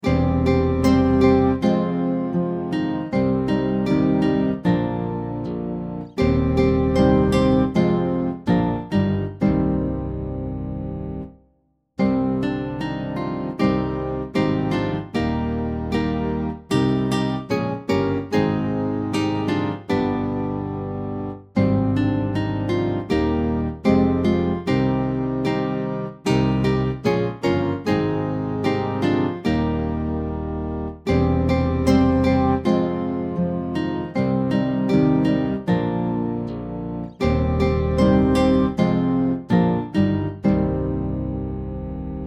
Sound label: Hymn, Instrumental; Let all mortal flesh; Picardy